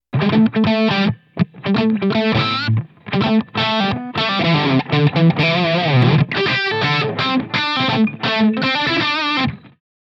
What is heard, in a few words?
e guitar dry